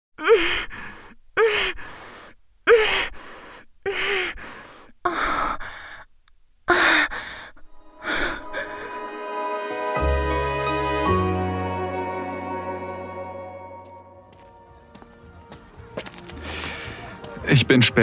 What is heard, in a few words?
female moaning; Riesling Rendezvous; Podcast; sinnlichkeit zwischen reben; outdoor; asmr